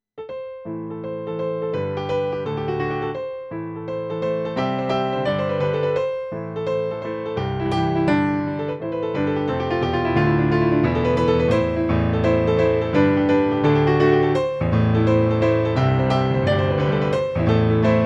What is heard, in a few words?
Battle Music